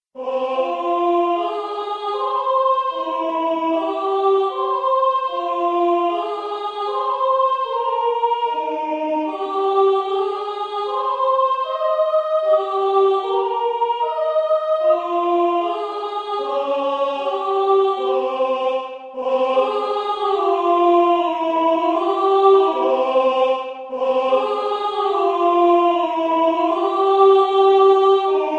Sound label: soprano; Mesures 17-33 - Voix 1